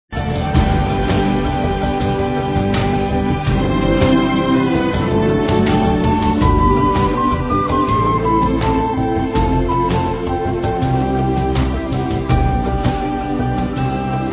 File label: song loop; simbas pride